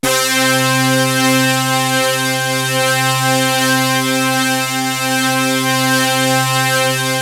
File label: POPBRASS; MORPHEUS